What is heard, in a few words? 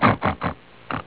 pig snort